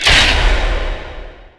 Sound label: pit target hero; underlord